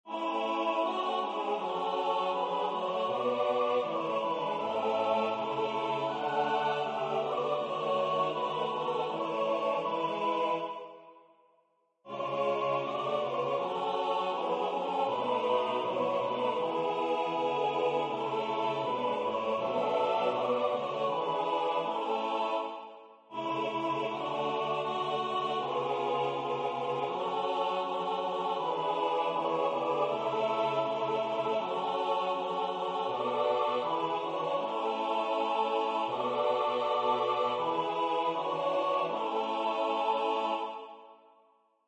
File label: SATB; Midsommertid